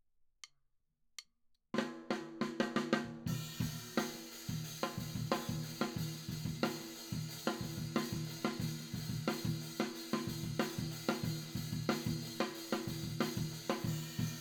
Tom

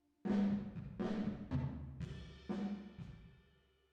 DRUM VERB

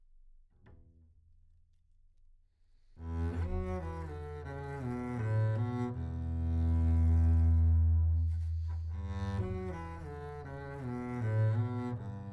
Bass

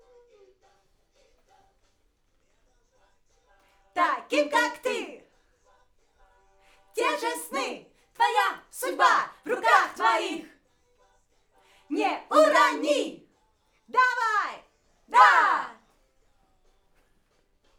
Backs AKG

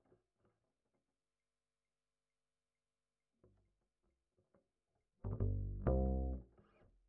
Bass Line

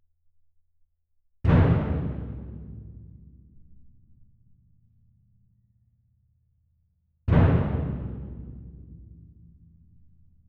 Twin R Perc

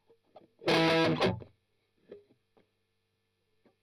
Strum Octav

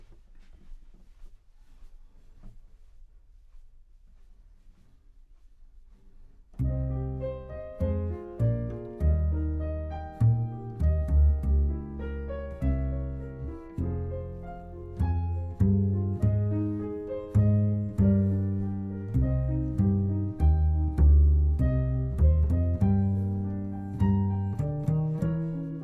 C Bass

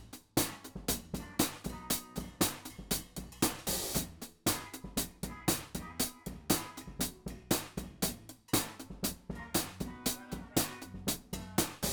Hat

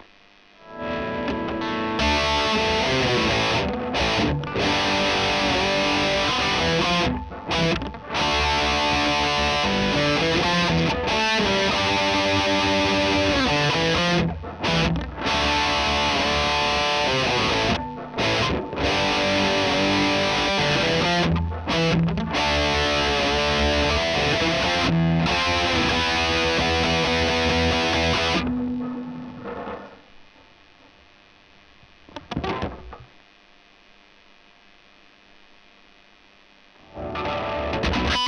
E Gtr

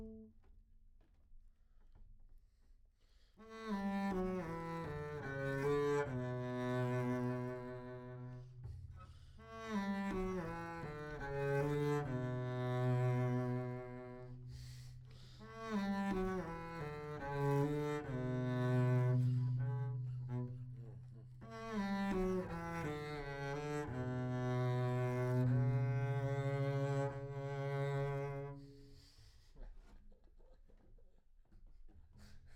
Bass

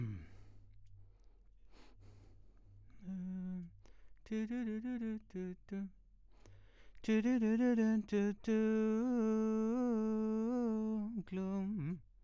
VOX

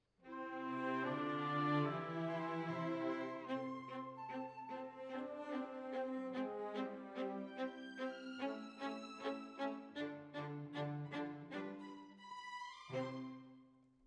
Violas, jazz for piano

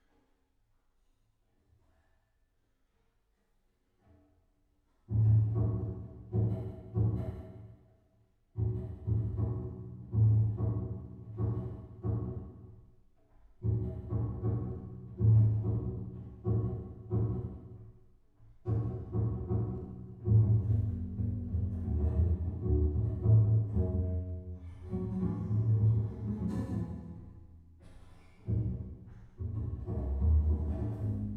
Bass

